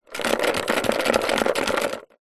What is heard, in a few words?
sport ping pong balls in plastic tub shake; zapsplat